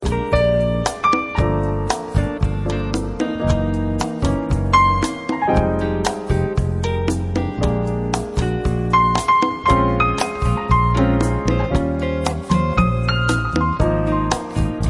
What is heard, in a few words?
Samba